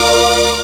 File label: synth a#, Dance Synths, Stabs & Hooks; Best Service ProSamples vol.37